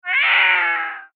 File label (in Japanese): ネコの怒り声; 自然・動物; ペット; 鳴き声; ネコの怒り声2